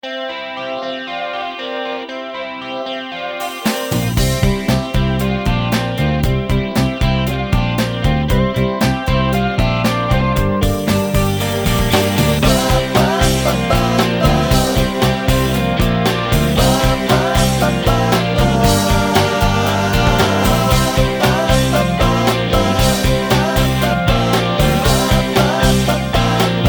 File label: Alt Rock